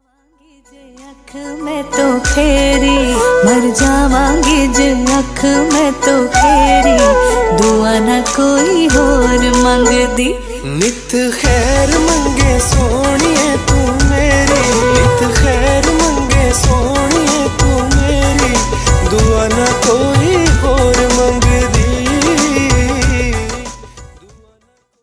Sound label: sad bollywood remix ringtone